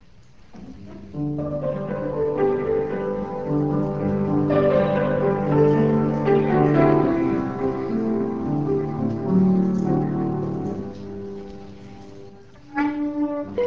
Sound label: special Music Ps 23 instrumental